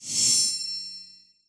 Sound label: Music; Music Frontend